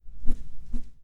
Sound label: Old Men Arm Move